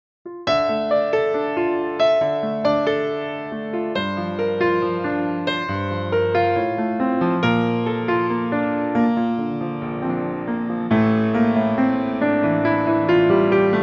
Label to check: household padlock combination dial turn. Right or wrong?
Wrong